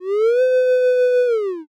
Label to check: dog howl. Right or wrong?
Right